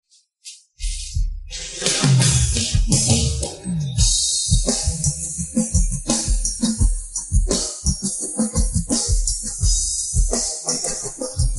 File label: drums; spleeter